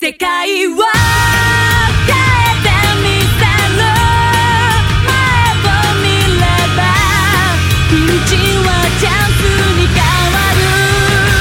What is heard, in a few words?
Skip Beat